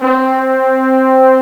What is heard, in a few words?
oboe; GMPlay; Reed